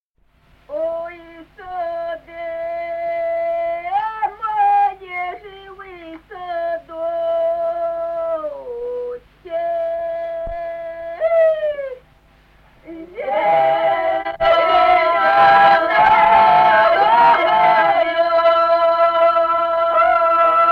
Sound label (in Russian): Ой, сады, мои ж вы садочки (петровская)